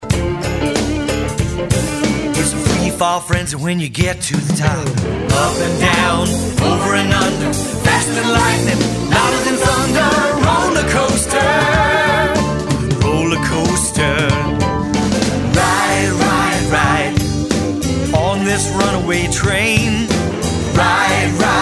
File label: Southern Rock half time